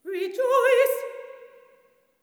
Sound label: SOP. TRAD, Symphony of Voices; Spectrasonics